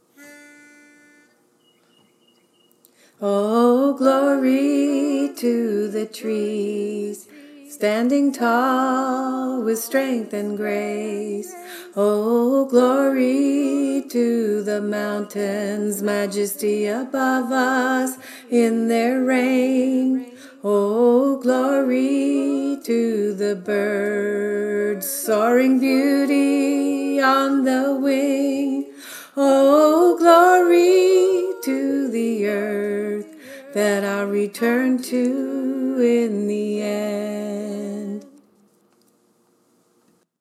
Tenor; The Freedom Choir

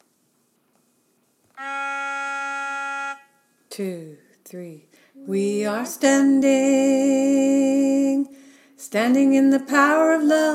Alto lead; choir; The Freedom Choir

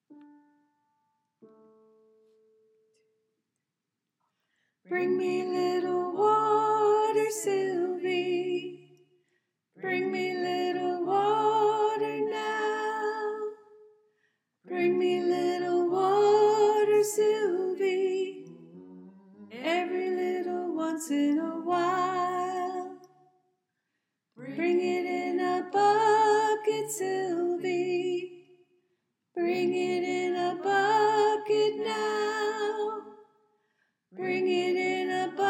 Soprano

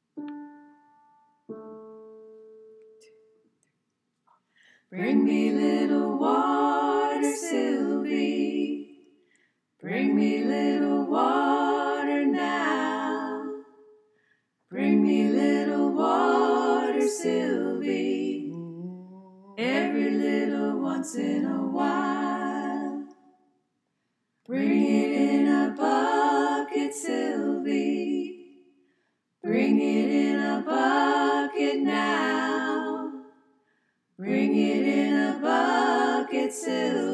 All Parts, choir

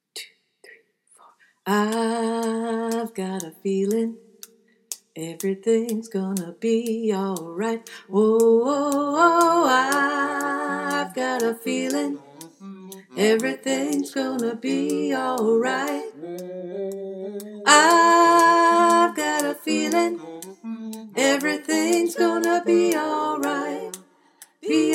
Tenor

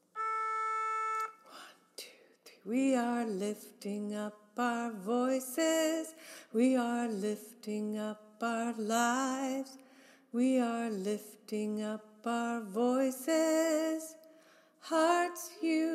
Alto lead; choir; The Freedom Choir

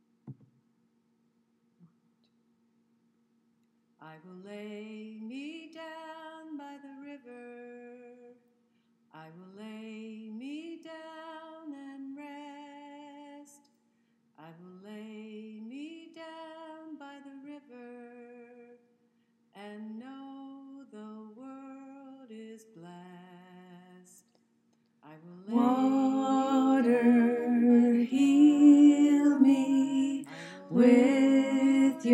River Love Song Alto

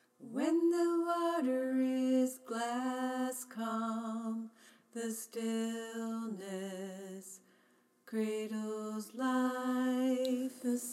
Tenor female, choir; The Freedom Choir

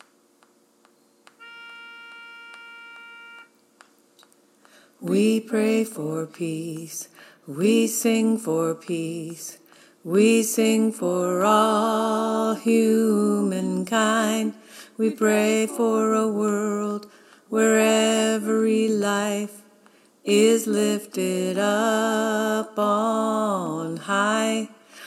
tenor, choir

